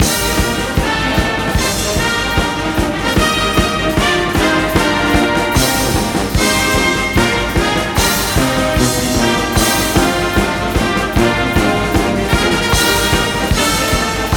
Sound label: Fight Song